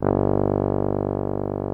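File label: tuba G1 stereo; TUBA +CORNET; Greytsounds VOL-1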